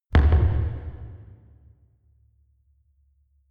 closing church door

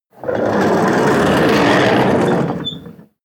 slide wooden door short

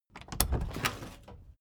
case lock open

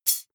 midsize metal thin clank drop

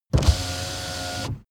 car window back open part

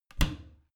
bathroom cabinet creak close heavy; objekte; tueren

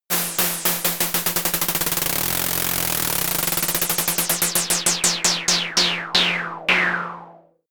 synthetic spin; bewegung; comedy-cartoon; soundpool

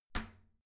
toilet lid close soft; haus-garten; badezimmer

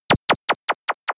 snap shot; whooshes rises; hits impacts

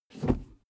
suv push forward gear straight fast; verkehr transport; autos

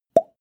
plop; koerpergeraeusche; menschen; soundpool

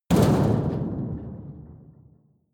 metal impact sheet rumble rattle hard; hits explosionen; metall

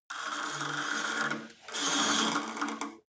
cable machine old pull release, fitnessstudio

